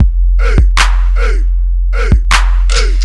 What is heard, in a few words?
Loop